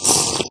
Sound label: drink; Minecraft